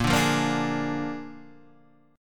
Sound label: A sharp 7th A sharp, Guitar